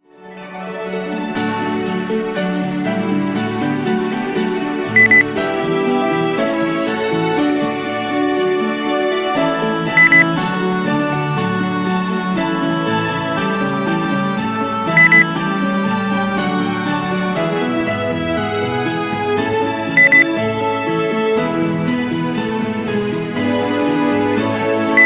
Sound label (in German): weihnachten kling gloeckchen